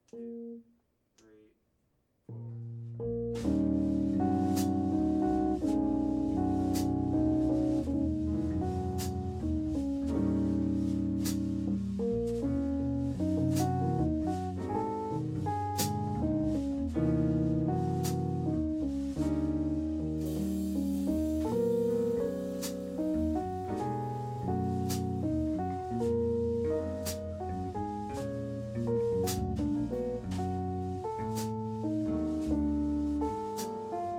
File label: jazz